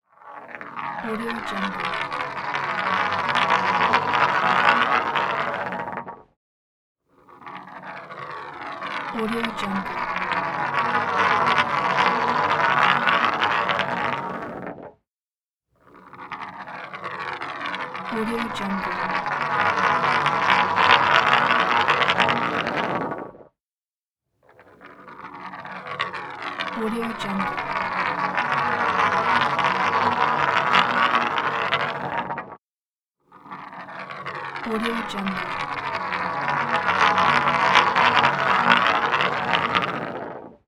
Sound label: small marble approaching